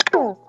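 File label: Chuckarun Guitar Up; Mo' Phatt; Digital Sound Factory Mo' Phatt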